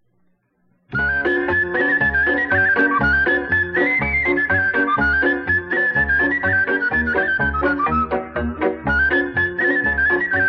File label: Romania Pe Batute, Folk Dances; Petaluma; 2nd String Folk Dances; Romanian